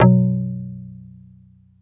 BASS; Africa bass

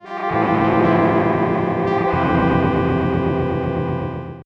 Synth, Trumpet Animalz

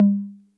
Conga Low 808 Color

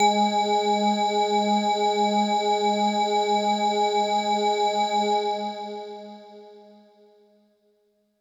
Hello Kawaii G#2

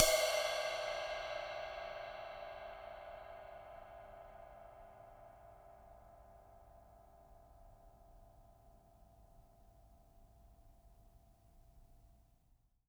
Ride Acoustic; Genesis

